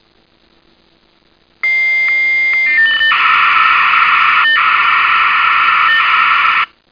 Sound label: Connect